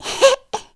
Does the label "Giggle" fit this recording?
Yes